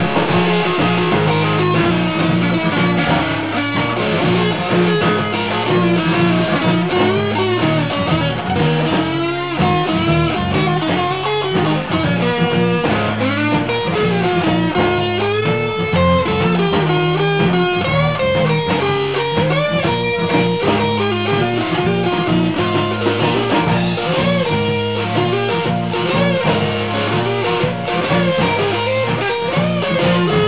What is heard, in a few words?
Rock